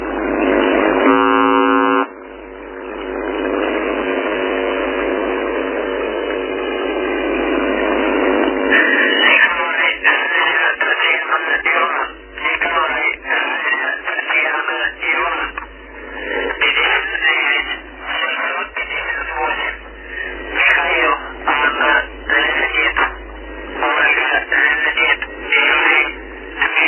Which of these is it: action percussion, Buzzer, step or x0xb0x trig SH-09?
Buzzer